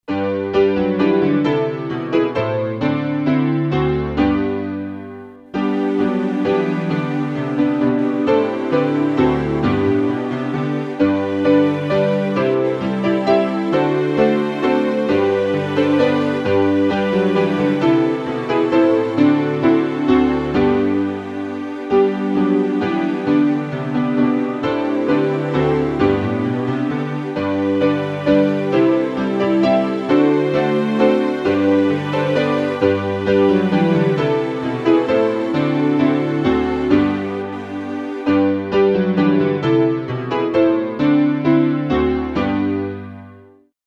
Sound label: music